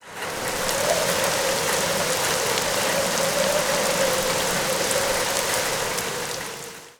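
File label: Rain Moderate to Heavy with Sleet and Wind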